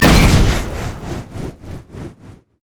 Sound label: thermal charge; noxian; LoL; urgot